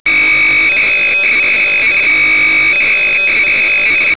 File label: modem